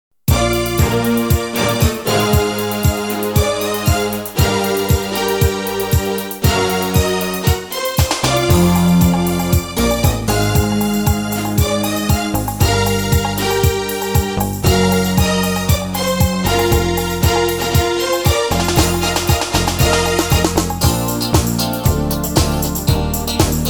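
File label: Bb Live track; female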